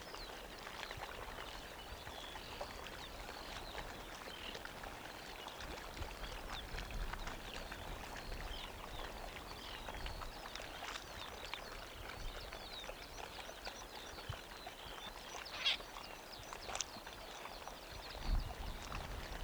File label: birds and lapping water